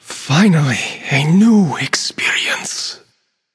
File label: death; smite; hou yi